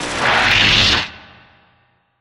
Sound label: cry